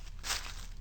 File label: grass, footstep